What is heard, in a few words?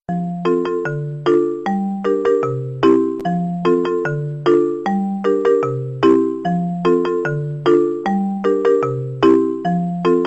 i phone 7 plus marimba ringtone